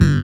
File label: SYN OLD, DANCE SYNTH; Sound & Vision - Gigapack I CD 2